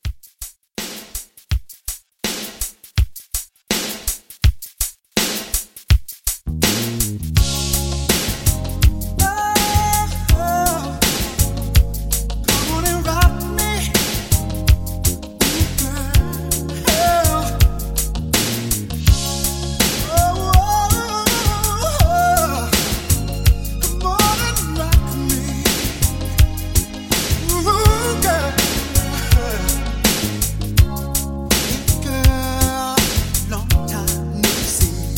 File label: 80s RnB Re-Drum 82